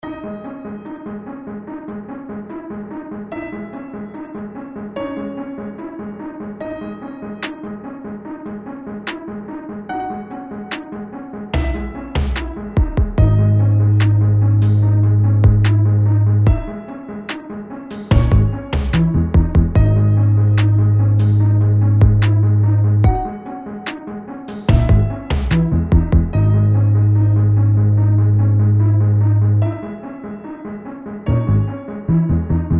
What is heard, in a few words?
TRAP